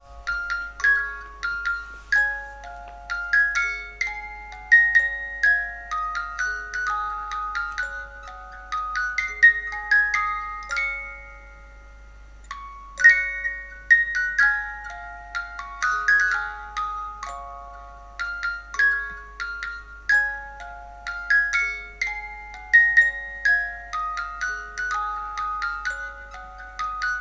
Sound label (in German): Spieluhr Brahms Wiegenlied